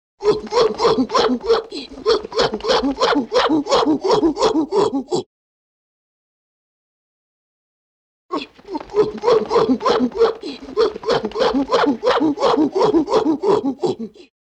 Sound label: Monkeis Voice